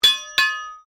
Strike Metal On Metal Heavy Ring Double

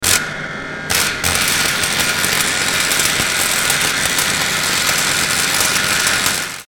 Electrical Glitch Distortion Current; Production Element Imaging Element Accent Transition

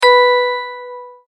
multimedia tone correct; Sound Design; McKinney Sound

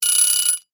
Egg Timer Bell Ring

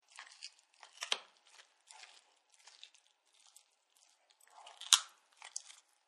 Bone Crunch, Dogs; Animals

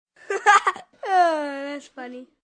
Laugh Funny, Laugh, 1 child, short; Laughter

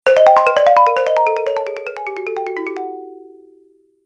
Cartoon Slow Fail; Cartoon Sound Design Sound Effects

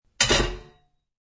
Utensil Sink, Utensil clangs in sink; Utensil Thrown into Sink; Clank Sound Effects; Objects

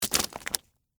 Debris Green Pepper, Guts, Vegetable; Texture; BlastWave

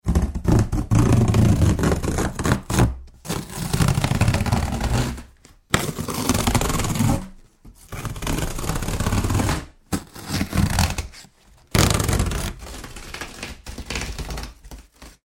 Tearing Cardboard Box Open, Various moves; Materials; Tom Hutchings

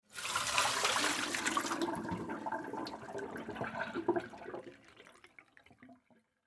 Sink Pour Down, Water Sink Pour Down Drain Liquid; Bathroom; Household; Blastwave

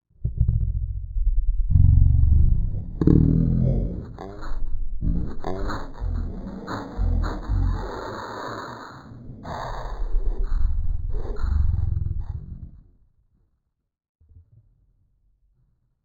Old Style Fractal Impacts; Hit Sound Effects

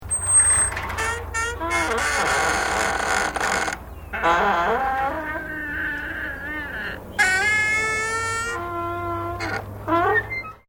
Door Wooden Gate Old Squeak

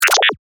Multimedia Beep, Technology, Multimedia, Beep; Beeps

